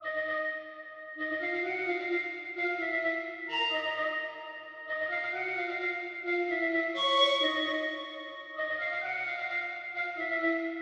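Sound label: Japan Flute 141 bpm Bb minor; New Nation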